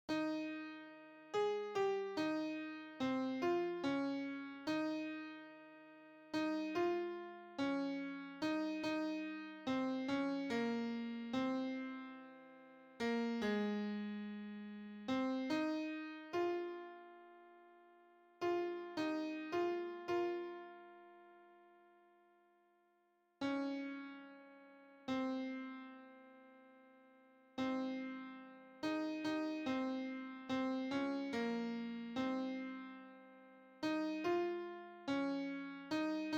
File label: Alto